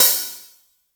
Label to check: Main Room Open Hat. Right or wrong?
Right